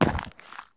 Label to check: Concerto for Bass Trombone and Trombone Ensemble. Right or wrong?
Wrong